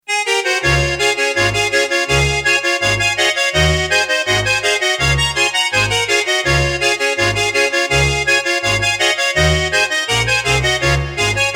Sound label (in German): Seegusler-Polka